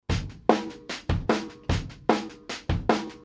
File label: Roland S-330 Sampler Drumloop 15 kHz